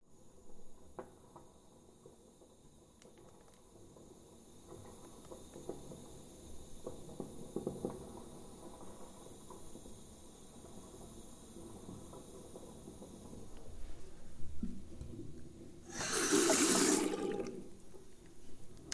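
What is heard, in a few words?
water boils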